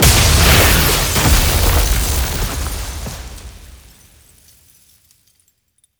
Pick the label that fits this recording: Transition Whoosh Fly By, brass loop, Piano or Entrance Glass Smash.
Entrance Glass Smash